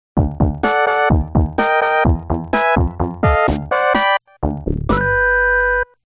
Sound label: Game Over; avalanche